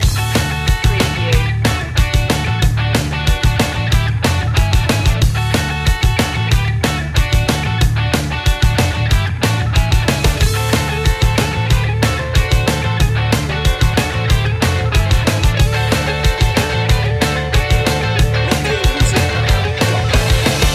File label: american summer loop